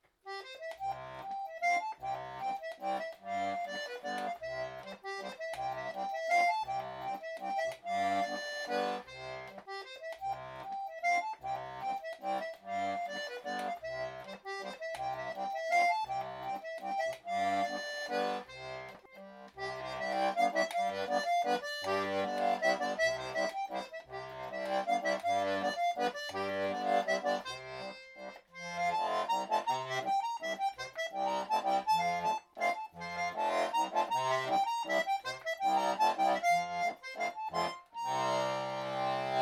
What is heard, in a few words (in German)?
akkordeon BRAND mazurka